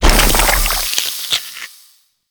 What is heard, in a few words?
Gain Ink Stacks; Gameplay; Warframe; Shadowgrapher